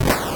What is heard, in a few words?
8bit se